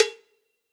Cowbell Big Old School; Drums; Drum Hits; Standard Hits; Ableton; Factory Packs; Session Drums Studio

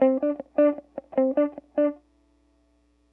077 Pop Guitar S-Note Dm; Pop Rock; Electric

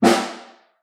Snare Far; Ableton Foundation FreePacks; Snare Designer

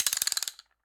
Castanet A Flam; Latin Percussion; Drum Hits; Wood; Ableton; Factory Packs

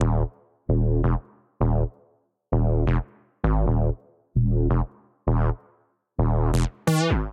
Hard Garage E Major 131 bpm, bassline; MIDI Clips; Tonal; Skitter and Step; Ableton Factory Packs

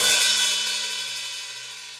Hihat Open Shank; Drum Hits; Standard Hits; Old School; Ableton; Factory Packs; Session Drums Studio

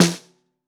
Snare Bottom

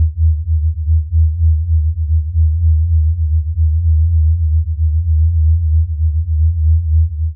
Binaural Drone; MIDI Clips; Instruments; Ableton; Drone Lab

